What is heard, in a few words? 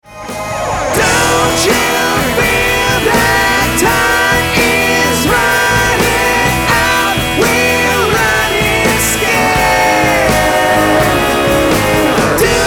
Ooh; Florapop